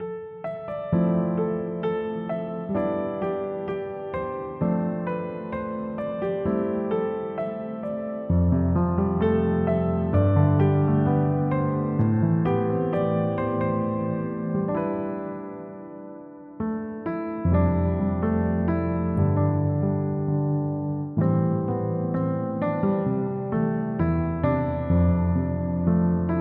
Piano Arrange; Death's Gambit Afterlife; Forest Meadow Piano Arrange

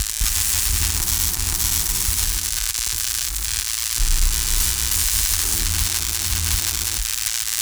Weapon Loop (Laser)

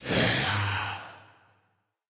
Grim Cemetery Summon Moan; Fallen Ones

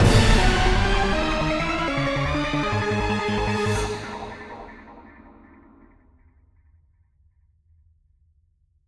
Music Stings stinger; Kragle

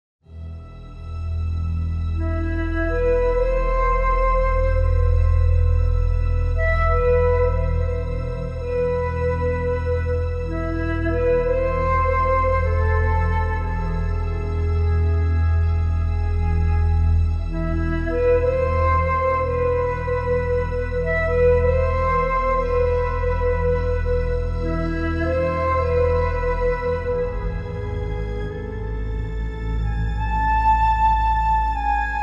Forest Intro Music; bramble the mountain king; ps4 ps5 switch windows xbox one xbox series xs

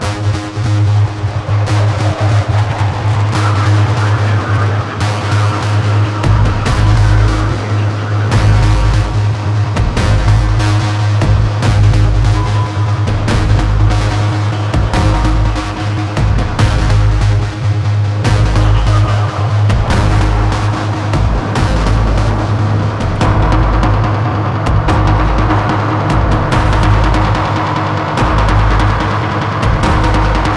Office Music Loading; Battlefield 3

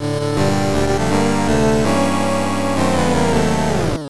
Lose Match; Nekketsu Koukou Dodgeball Bu PC Bangai Hen; TurboGrafx 16

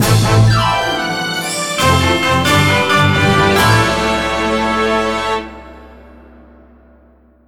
fanfare; Otome the Exorcist

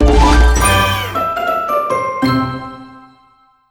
Crunchy Finish

